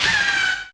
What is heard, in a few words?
VOC MAD; AMG - Vince Clarke Lucky Bastard